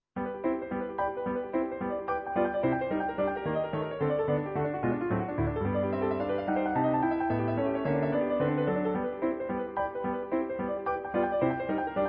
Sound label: Piano folk